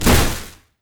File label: Hit Air